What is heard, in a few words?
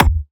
Percussive